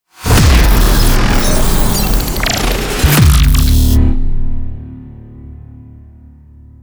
Agressive Logo

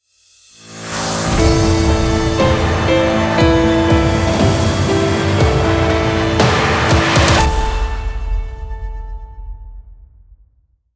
Heavy and Strong

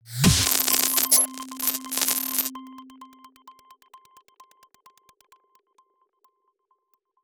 Glitch Logo

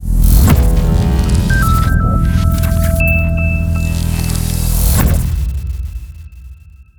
Powerful Moment; BlueRain

